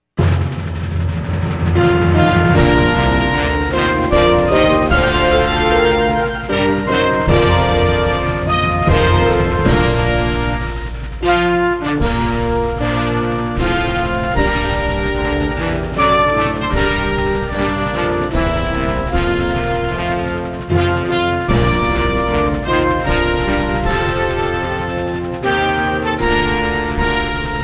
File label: The Star Spangled Banner Accompaniment; 2nd grade